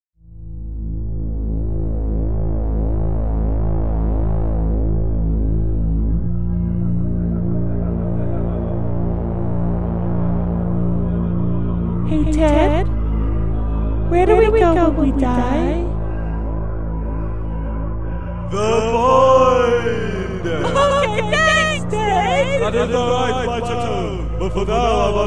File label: death; cave